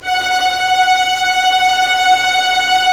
STR SEC; Roland - LA Composer vol. 1

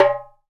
TABLA TIK; TABLAS

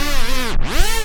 SYNTH SCRATCH, Vinyl Scratches

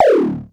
tom; Klone Dual Percussion Synthesiser

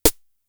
Snare, Drum Machines; Fricke Schlagzwerg

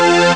synth accord; Amiga Soundtracker Sample Packs

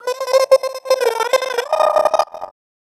VOICE FX, Dance Vocals

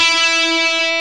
GUITAR, POWER GT

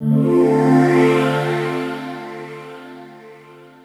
chord, 145 BPM, drum & bass; Ueberschall; Drum & Bass Flash Trackz

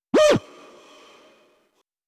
128BPM FX Vox; Vengeance; Essential Clubsounds Vol.5; Early Morning Kit; Root G

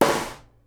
SNARE, Live Snares

